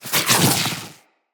Root Spider Mother Web Shot Proj Whizz By; Animals; Spiders; Abilities

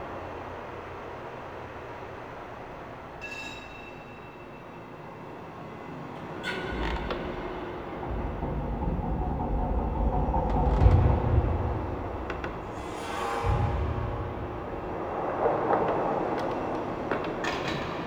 Tailor Shop Large Bed Loop; Interior; Warframe; Nineteen Ninety Nine

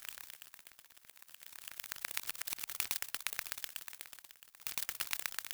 Fairy Butterfly Loop; Warframe

